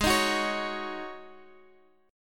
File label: Ab 7th Suspended 4th